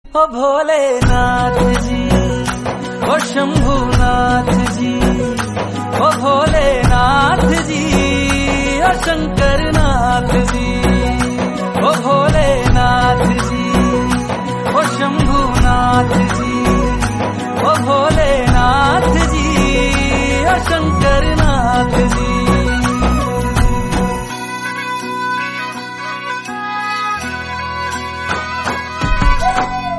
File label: Hindi Song